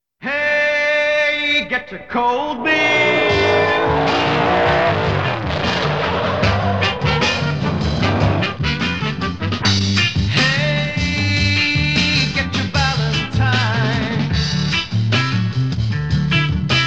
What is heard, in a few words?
Jingle